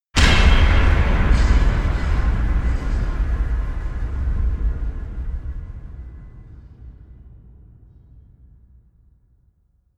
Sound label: evil slams